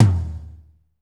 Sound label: tom, lite jazz, Roland; Northstar; Drumscapes Roland; AC Lite Jazz; Toms